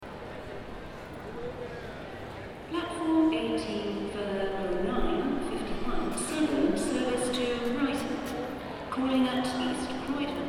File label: Londyn Victoria Train Station